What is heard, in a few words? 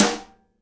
SIDE STICK, Live Snares